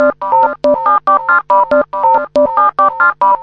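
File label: phoned my acid order, 140 bpm; SYNTH AND ELECTRONIC LOOPS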